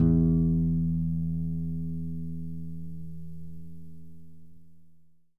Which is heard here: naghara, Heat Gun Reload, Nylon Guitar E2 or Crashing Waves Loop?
Nylon Guitar E2